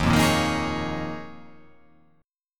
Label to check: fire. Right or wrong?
Wrong